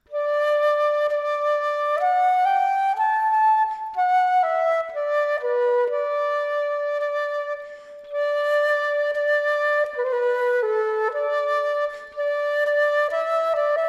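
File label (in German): Querflöte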